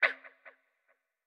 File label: Skeleton Hurt